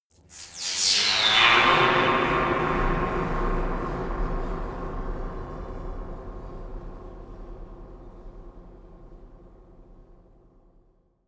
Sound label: cathedral noise drop